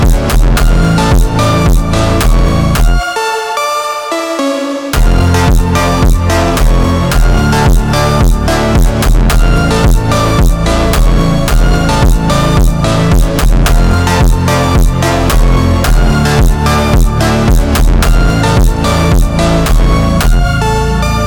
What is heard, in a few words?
Instrumental Super Slowed